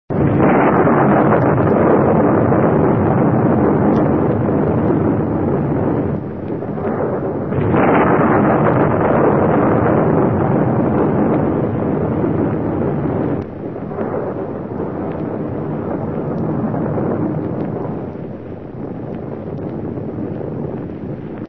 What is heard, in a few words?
thunder rumble